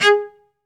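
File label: SOLO cello, Strings; ILIO; Synclavier Strings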